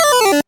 menu open; UI & Menu; freepixel

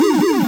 hand full; Cards & Board

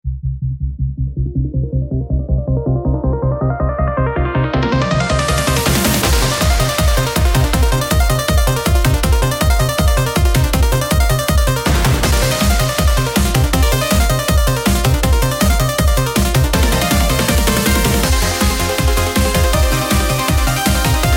boss fight; freepixel

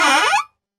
door open; freepixel; Environment

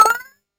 potion drink; Items & Pickups

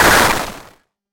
crushing wall; Traps & Hazards; freepixel

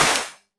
splash; freepixel; Environment

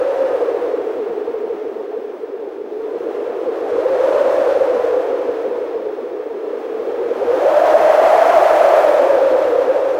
blizzard, nature weather

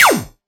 laser shoot, sci-fi; freepixel

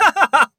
taunt, character voices

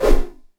vine grab; Platformer

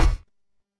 mech footstep, sci-fi; freepixel

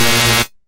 lightning bolt; Magic; magic spells

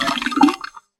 milk pour; Farming

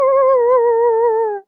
wolf howl; Creatures

